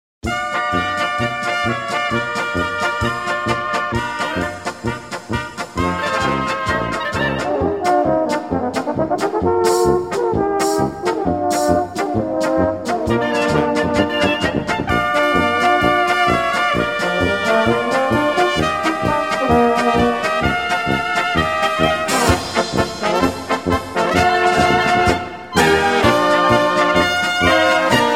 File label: instrumental